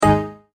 multimedia tone exclamation; McKinney Sound

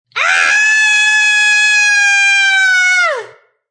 Female Scream, Female horror sounds; Screaming; Voice

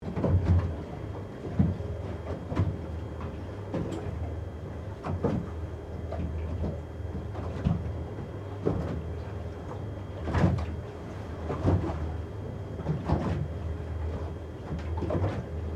Boat Slow Speed, Water, Splashes, Loop; Transportation, Watercraft; Bow, Perspective; Police, Marine, Division, Cherokee; BlastWave

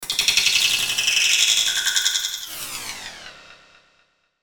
Logo Clicks Synth Descend Delay; Sonic Logo; Blastwave; Sonic Logos; Production Element Imaging Element Accent Transition

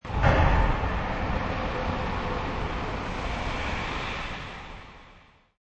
Release Valve; Mechnical hydraulic servo release sound with plenty of low end

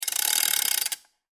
Chain Retract; Chain Retract Gear Crank Winch; Factory; Industry; Blastwave

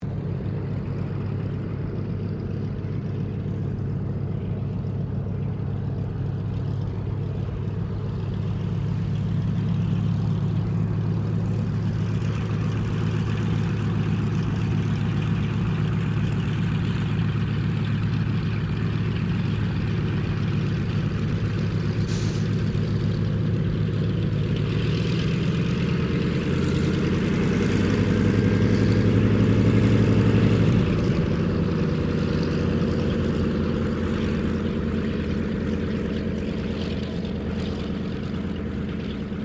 AIRCRAFT DOUGLAS DC3 TAXI; Qantas 1942 DC3; Airplane; Airplanes; Vehicles

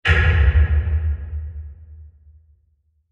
Heavy Wood Impact With Large Reverb; Blasts; Impacts; CraftedSignals

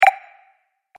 Fantasy Game Collect Sound, video game

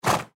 Horse Breathe Out; Animal; Blastwave

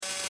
Electrical Glitch; Technology; Noise; Electronics

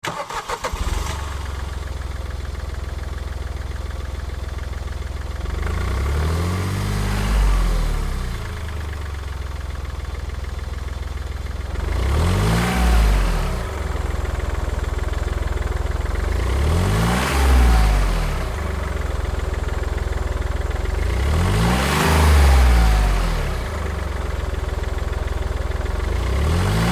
Car Smart Car Start Revs Off; 2005 Smartcar Fortwo; Vehicles; Big Room Sound

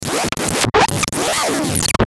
Glitch Distortion Ascend; Glitch Distortion Stutter Ascend Descend; Glitch Sound Effects; Production Element Imaging Element Accent Transition

